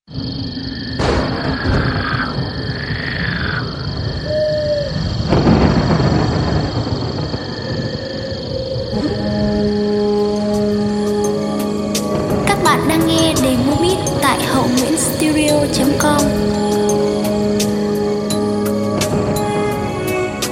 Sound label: beat, playback